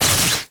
Plasma Hit; gamerip

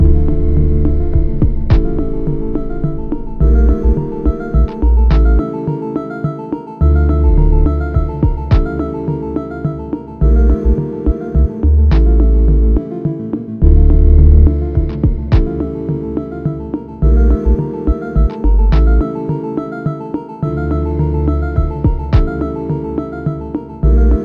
Fall Loop Wraparound Reverb; The Fall; Going Under

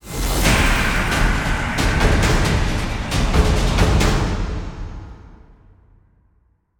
Stinger Fill

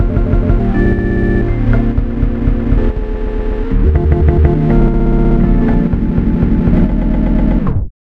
Song Death Glitch; Cobalt Core

